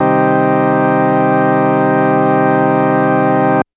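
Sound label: Organ Farfisa minor; TrashLibrary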